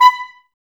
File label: Clarinets, Wood Winds